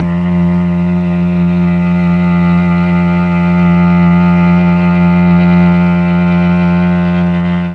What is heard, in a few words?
F2 mellotron cello